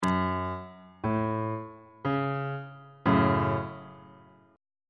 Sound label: g flat minor chord